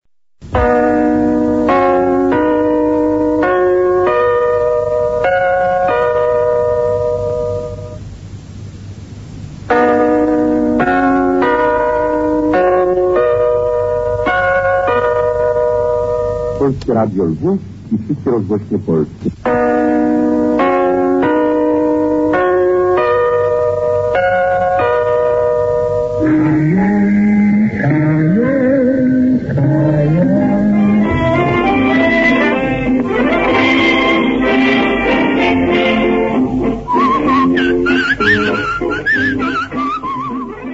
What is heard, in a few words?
interval signal; pr lwow